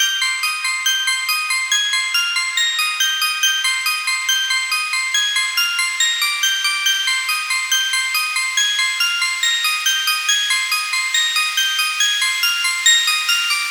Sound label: 140BPM C; Vengeance - Essential Clubsounds Vol.4